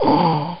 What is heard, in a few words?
DYING; BattleSNDS